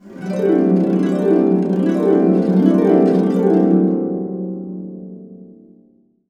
C CASC UP DN, Harps

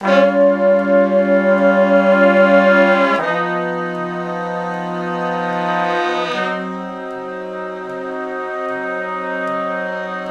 Slo Blues Chords [V, IV, I, I] ( Ab), Horncraft; Sonic Foundry (Sony Creative Software)

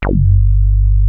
WEST SOFT G1, ANALOG BASS; Music Store - Claudius Diemeter Schwerlos

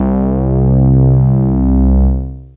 D2 Bass; Zero-G; Man Machine

